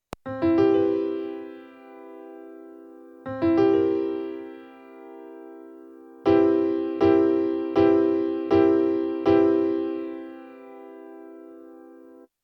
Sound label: maj7 vs 7